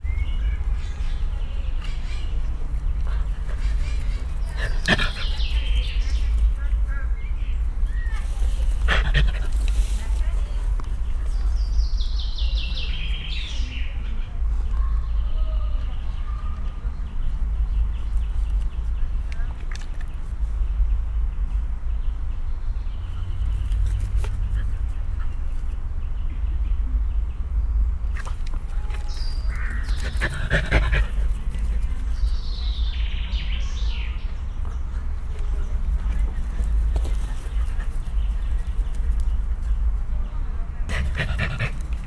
feszült állapotban, rozsomák; szegedi zoo 2011

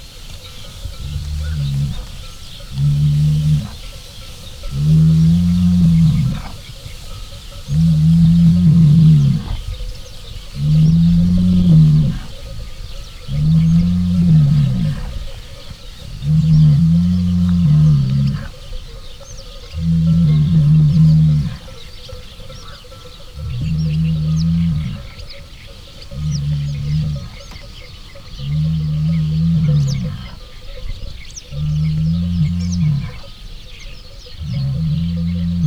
60 méterről, őstulok; Hortobágyi Nemzeti Park

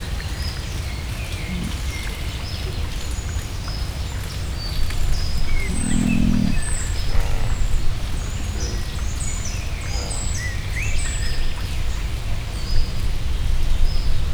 szülő és csikója, egypúpú teve; állathangok; miskolci zoo 2018

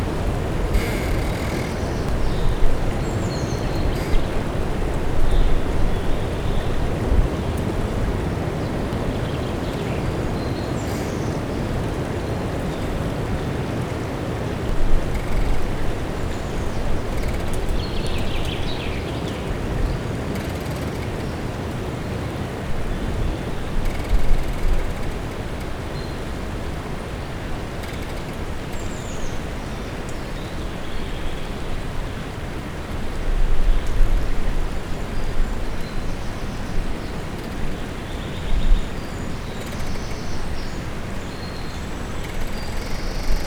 halk nyikorgás tavaszi szellő; Ménes víztározó után; fanyikorgás

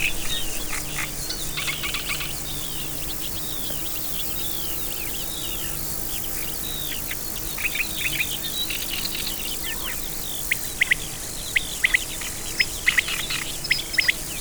előbb nádirigó, később háris madár is, lapály; teresztenye

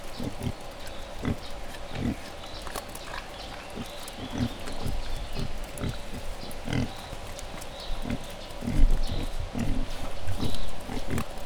malacok, vaddisznó; jaszberenyi zoo 2015

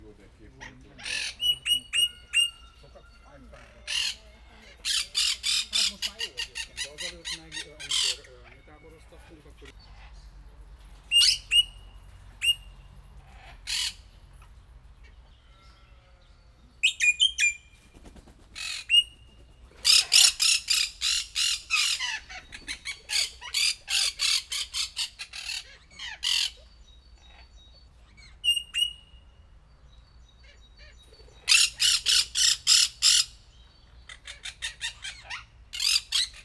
negy reszbol osszevagva, rozellapapagaj; debreceni zoo 2019

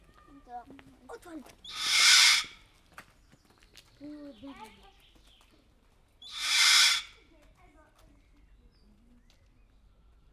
kétszer, sárgabóbitás kakadu; pecsi zoo 2017

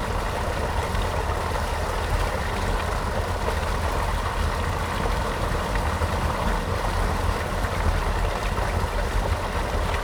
kisvasut menti vizes arok leeresztese, zsilip, hortobagyi halastavak

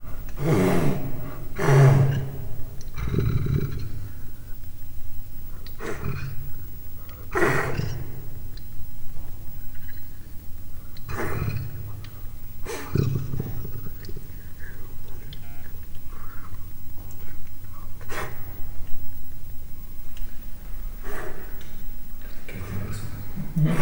három hónapos kölyök morgása, leopárd; miskolci zoo

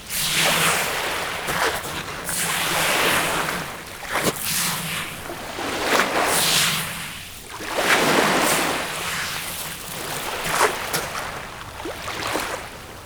kézzel márványkavicsok söprése, tenger; thassos 2014

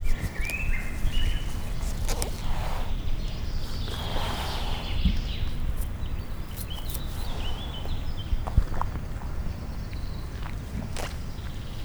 legel, kétpúpú teve; szegedi zoo 2011

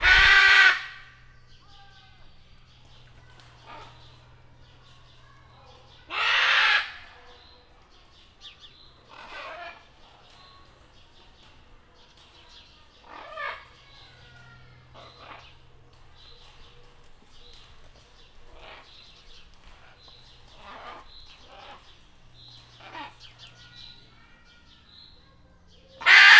szünetekkel, zöldszárnyú ara; szegedi zoo 2011

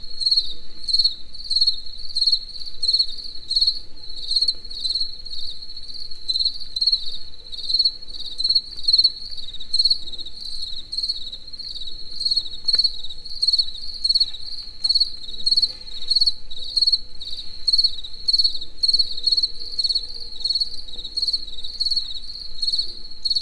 zenészek, tücsök; allathangok; termeszetben; egerturistahaz

